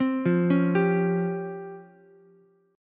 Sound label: Db Major Sharp 11th Db down Ukulele C6; arpeggiated; chord shape; JGuitar